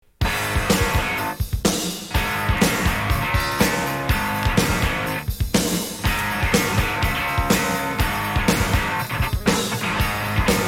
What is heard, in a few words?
song